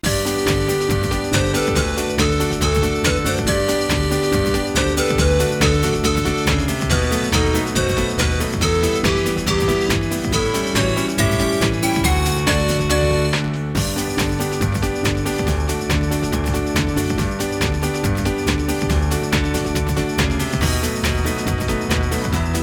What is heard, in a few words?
Song (Instrumental); Spotlight 2